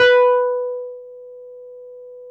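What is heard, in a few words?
Wurlitzer electric piano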